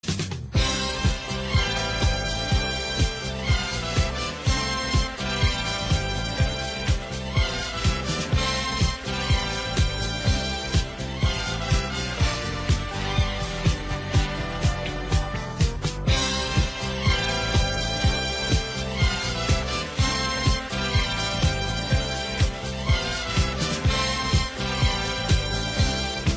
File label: Disco Theme Ringtone